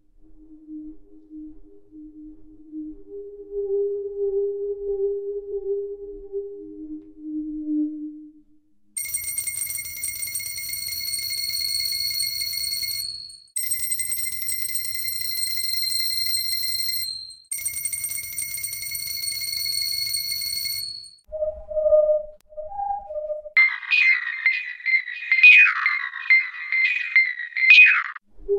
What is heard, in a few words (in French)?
Compo Melodie